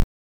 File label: ding dong; SIDTunes